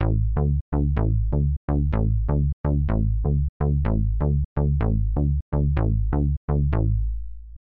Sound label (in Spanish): Bajo simple